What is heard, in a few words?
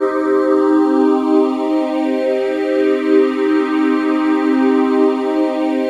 PHASE PAD, ATMO PADS; Best Service; XX Large